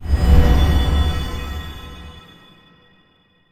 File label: transform; yamiko